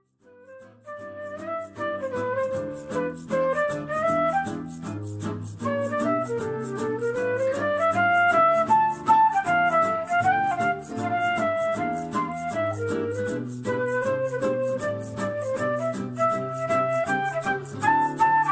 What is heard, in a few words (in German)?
Solo Querfloete